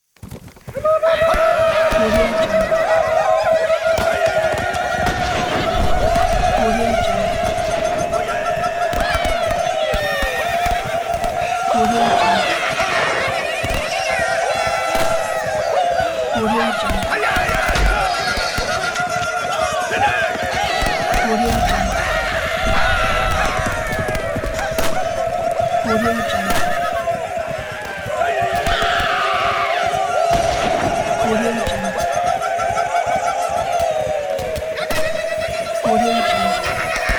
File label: native americans cowboy battle ambience